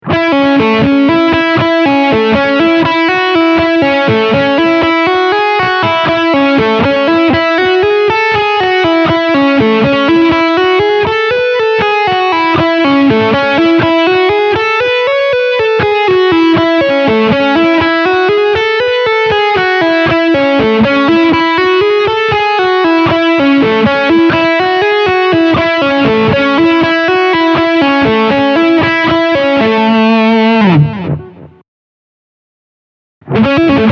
arpeggio La magg, guitar

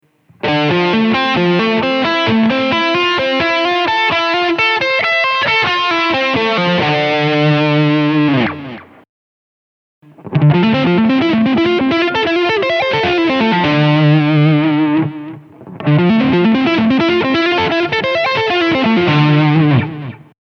RE min7; Guitar Club Settembre 2015

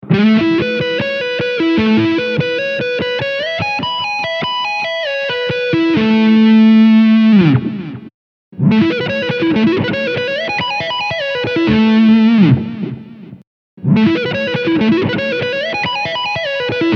La arpeggio, guitar

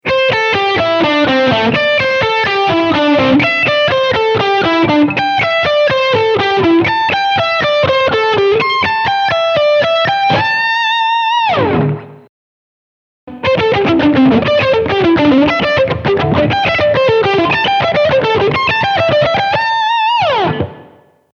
Pentatonica tempo disparo; guitar club n1 2015

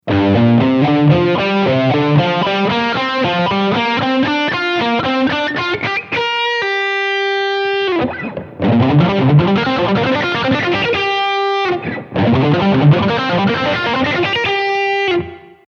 Pentatonica Sol sestine

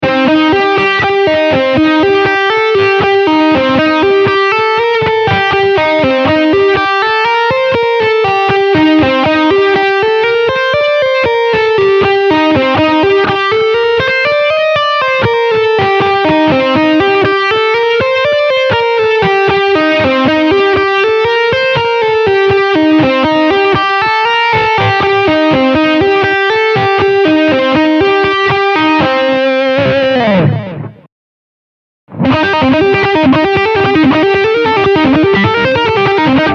arpeggio Do min dorico

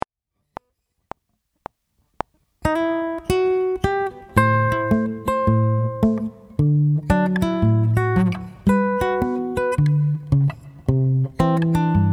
sezione in fingerstyle, Chitarra; Guitar Club Marzo 2025

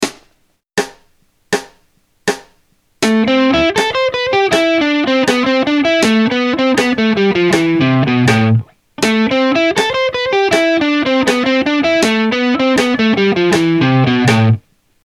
Terzine Quartine, Linee solistiche precisione ritmica e timing, rock guitar; Esercizio 1; DYNAMIC ROCK GUITAR; Riccardo Gioggi

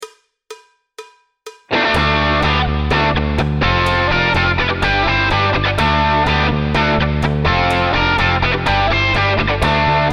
style senza batteria, guitar

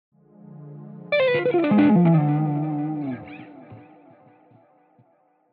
Esercizio 1, scala maggiore armonica di Do; HARMONIC MAJOR MODES; Andrea Balestra

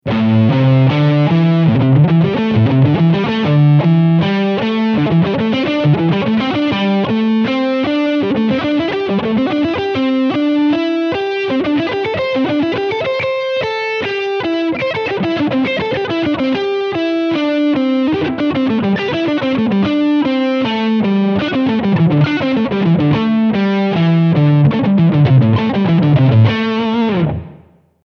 Pentatonica Variazioni Ritmiche; guitar club n1 2015

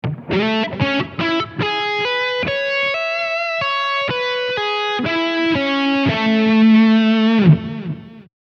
La maj9, guitar

